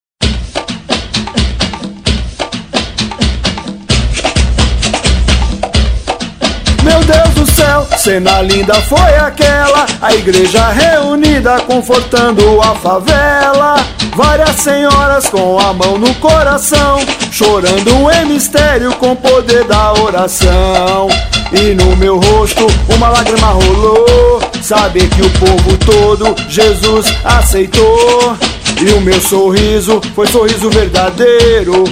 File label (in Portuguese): versão tamborzão